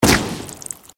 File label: body bomb